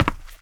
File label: wood step; Golden Crayon Sounds